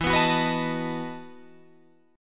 E Major 7th Suspended 2nd Suspended 4th, Guitar, Drop D